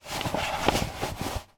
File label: household woodburner fire proof gloves put on